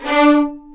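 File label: D4 marcato double bass; East West; The Ultimate String Collection; ARCO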